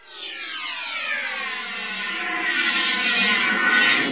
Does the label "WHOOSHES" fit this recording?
Yes